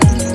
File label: kick